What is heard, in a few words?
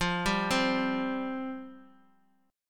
F Suspended 2nd, chord; Fsus2 arpeggiated; x,20,17,17